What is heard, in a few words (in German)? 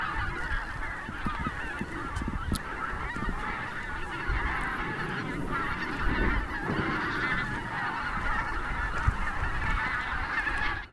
blaessgans